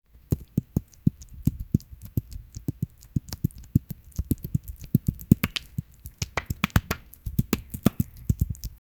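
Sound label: a hand patting wet spaghetti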